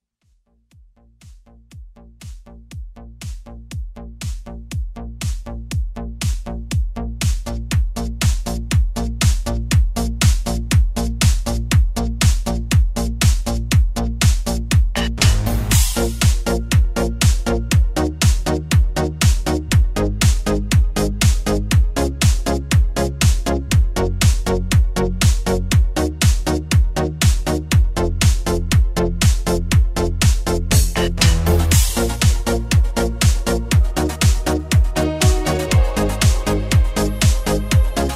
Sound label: dance mix italo disco